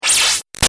Shot Laser High; Sound Design; Mutate; Lasers; Big Room Sound

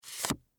Game Card Remove, From, Stack, Small, Card; Sports, Board, Game, Card, Remove, From, Stack, Small, Chance, Card; Board Game Sound Effects

